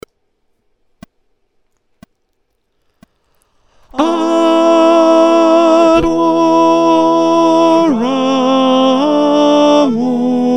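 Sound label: Alto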